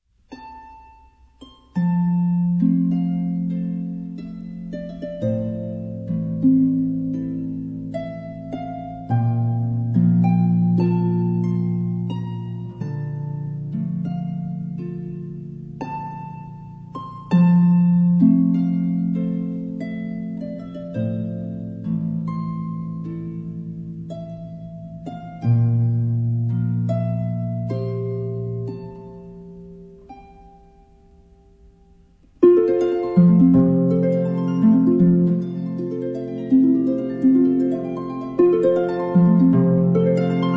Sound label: harp, Harp Sounds 3 Christmas carols